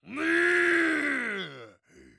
唸り声

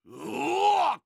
うおおおお！

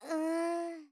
うーん…

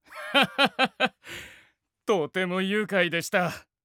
ハッハッハッハ！; とても愉快でした…！

